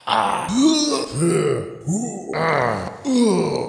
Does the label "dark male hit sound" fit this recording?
Yes